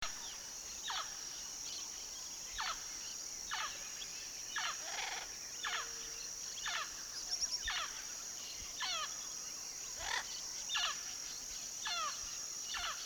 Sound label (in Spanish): Amazona Real